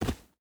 footstep barefoot dirt jump land; Rust

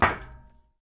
run metal; Escape from Tarkov

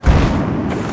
biomech air init dive

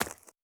stag footstep concrete hard; Rust

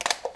Rifle Cock; Civ4 warlords

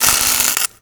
elec spark welding ext; Modern Warfare

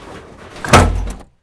Vehicle Hatches; Red Orchestra Ostfront 41-45

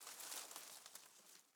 leaf rustle twiggy med

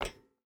footstep hide metal walk; Rust

